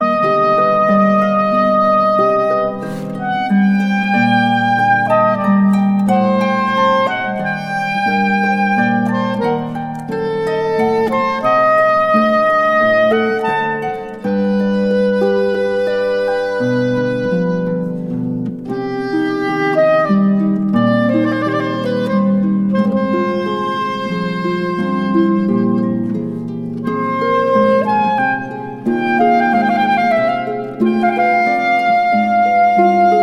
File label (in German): largo sopransaxophon